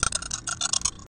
rattle B

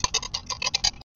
rattle E

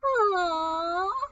Seal Whine

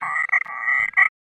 Frog Croak

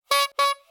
Clown Horn

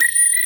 Melo Squeak

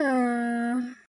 Tortoise Sigh

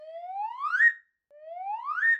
Clown Slide Sound Effects

